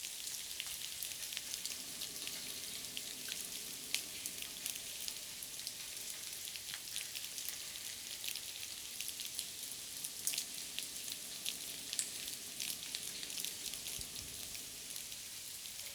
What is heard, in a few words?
Ambience pool area